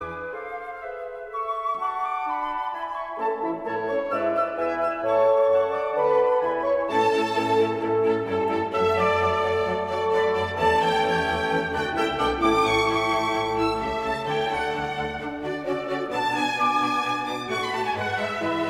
Allegro pianoconcert D minor; Mozart; K466

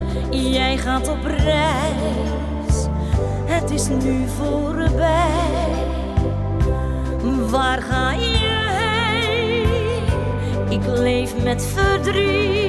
vrouw

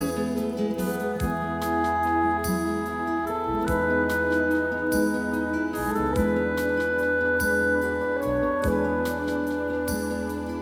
fluit

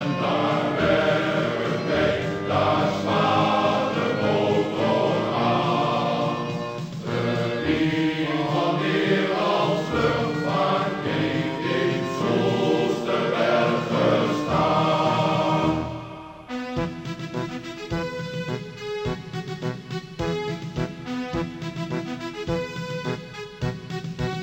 Koor (mannen) Luchtmacht; Soesterberglied

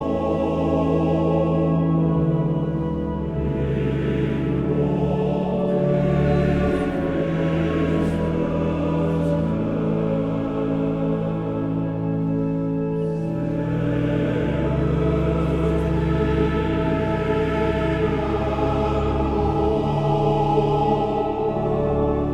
Koor (mannen); Laudate

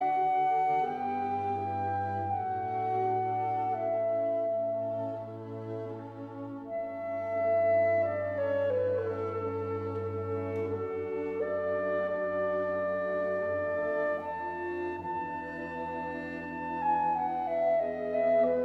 Larghetto (klarinet); Mozart

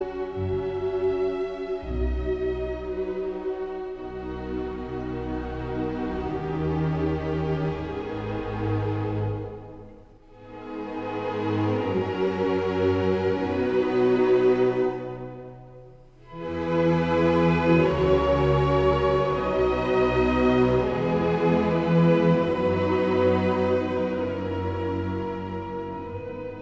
Pianoconcert Adagio

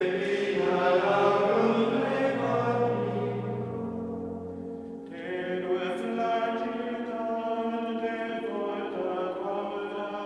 Koor mannen Gregoriaans; Inviolata

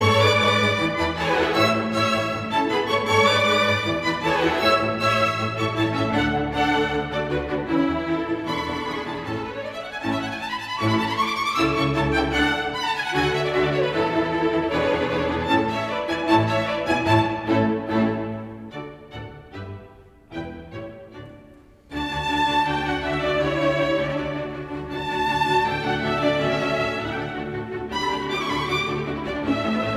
Divertimento in d majeur; Mozart; kv 136

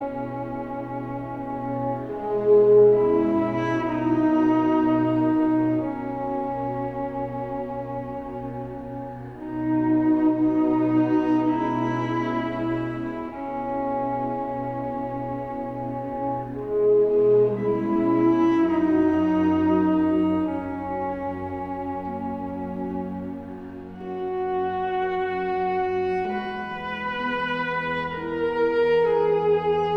Pie Jesu (instrumentaal)

